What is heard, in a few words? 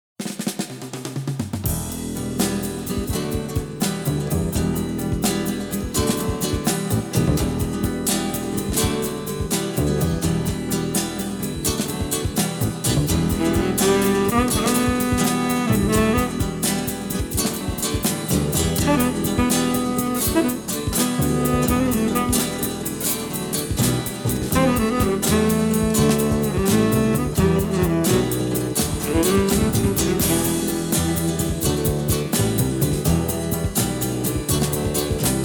Instrumental